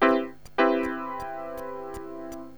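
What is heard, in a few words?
major 7th, synth chime; cubase; CUBASIS; KEYBOARD; PIANO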